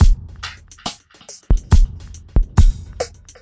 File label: 140 super dubby dubstep, drum loops